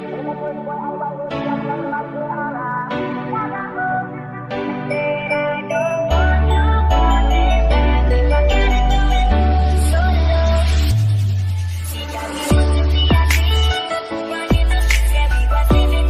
Solo English Best Ringtone

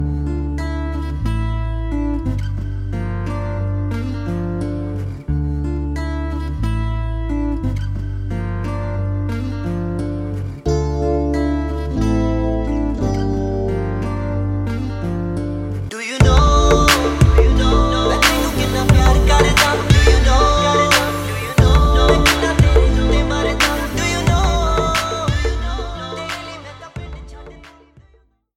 Punjabi Ringtone; Samsung Galaxy

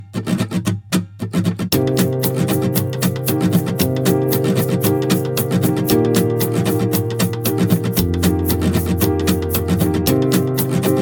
Electronic Ringtone

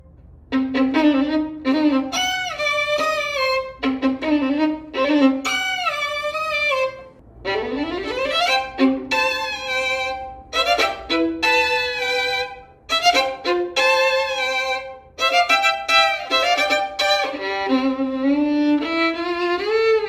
Old Song Violin Ringtone

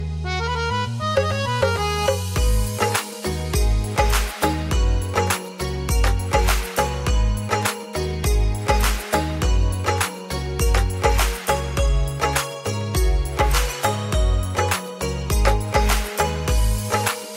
Old Hindi Instrumental Ringtone

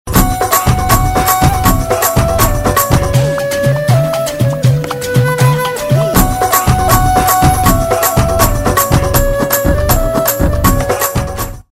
Ringtone Music Arabic

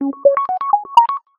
LG W10 Alpha Sms Ringtone

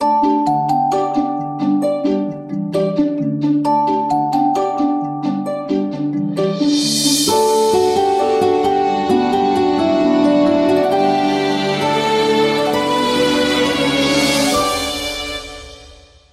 tune orchestral; huawei

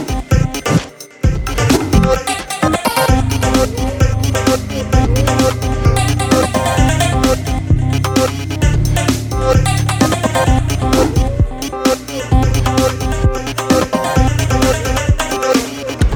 Electronic Ringtone

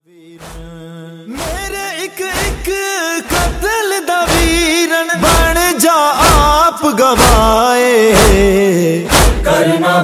noha

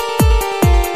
Notification Ringtone

